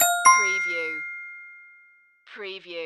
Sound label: Multimedia Alert